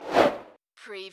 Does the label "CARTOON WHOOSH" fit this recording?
Yes